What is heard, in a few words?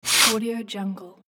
sniff nose